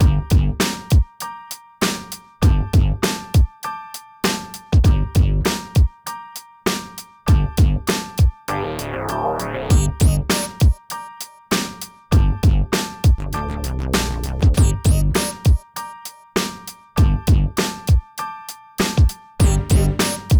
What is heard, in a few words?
Radio Hiphop; Gangstar West Coast Hustle; Android